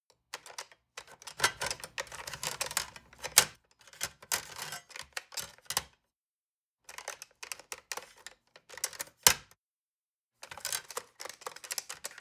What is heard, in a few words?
decoding machine handling rattle and clicking